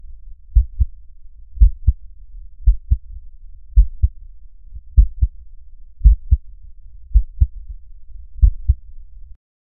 a slow distant thump; rhythm

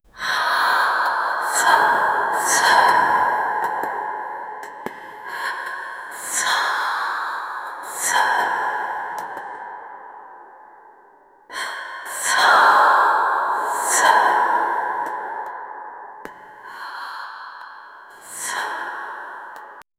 four airy woman voices th; human voices

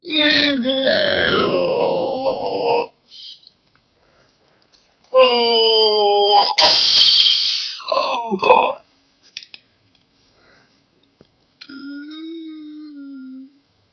for heartbreaking moments, emotions

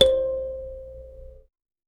kalimba mbira thumb piano

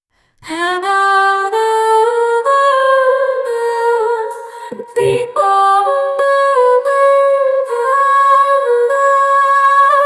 singer songwriter female, arabic

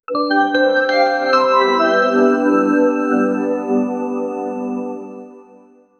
a very simple retro pc, computer

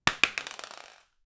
a splat sound off a, gel

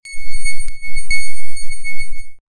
error beep funny system

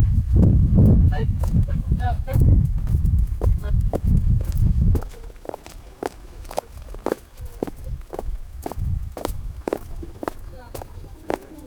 group of people walking, desert

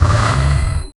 gurgling undead knight, undead

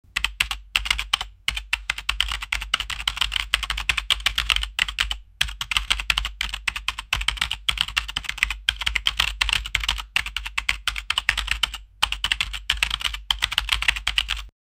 poppy keyboard typing sound

